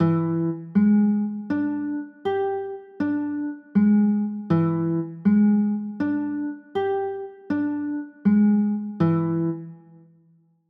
e 7 sharp 9, chords

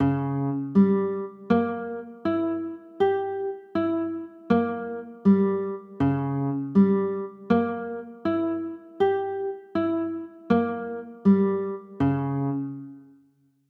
c sharp dim7

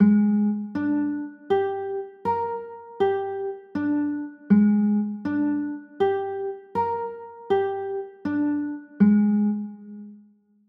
b flat 9, chords

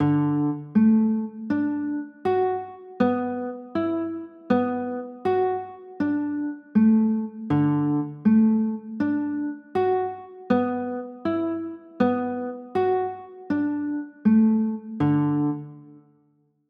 d 6 9

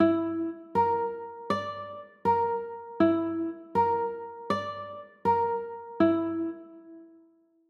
e 7 sharp 9, chords; e 7 sharp 11

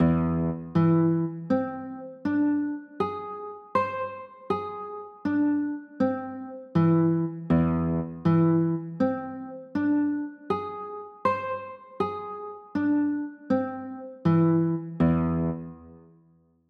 e 7 sharp 9, chords

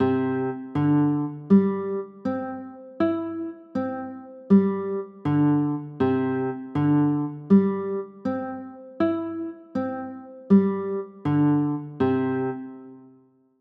c add9, chords

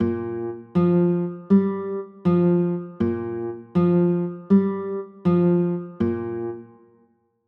b flat 9, chords

